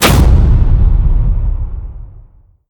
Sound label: hand lightning